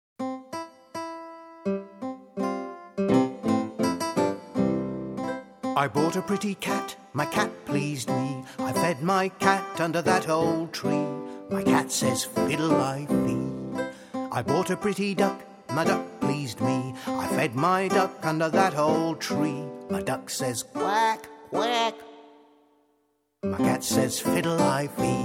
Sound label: fiddle